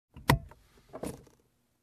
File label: Car compartment click